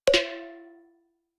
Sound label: metallic clank